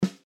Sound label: Snare Drum Sound